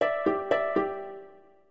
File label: Move Alert; In Move Alert